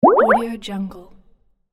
creative bloop pop up

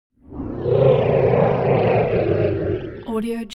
creature troll roar calm

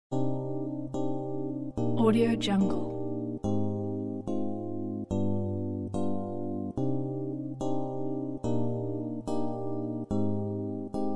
crying cello

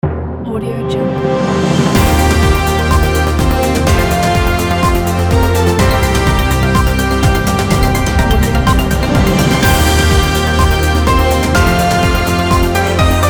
news intro logo

